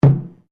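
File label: Drum Taiko Large forte, Japanese Taiko Drum; Miscellaneous Musical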